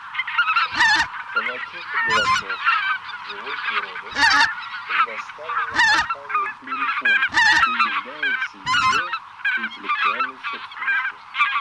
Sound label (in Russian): Белолобый базар Oche Lombardelle; plurifon